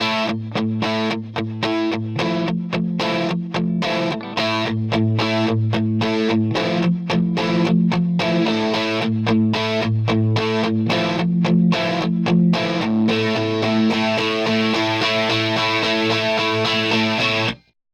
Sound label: Short Guitar Thickener; 24/96, Series routing, moderately high Modulation, 20ms Delay 1, 15ms delay 2, low repeats